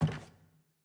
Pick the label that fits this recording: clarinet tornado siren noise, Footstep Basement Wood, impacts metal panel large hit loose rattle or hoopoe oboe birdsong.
Footstep Basement Wood